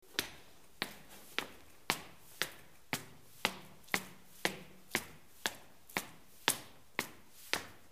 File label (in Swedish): steps upstairs, inne; FOTSTEG